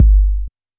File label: vock, Kicks